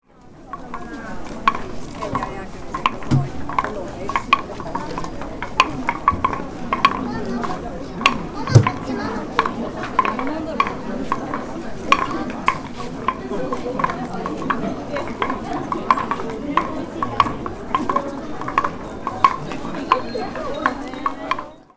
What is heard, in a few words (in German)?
Kyoto Holzlatschen